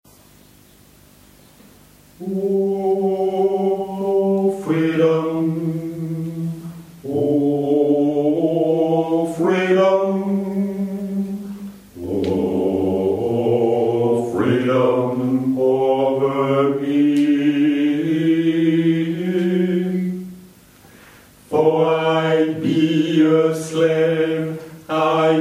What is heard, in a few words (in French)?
Basse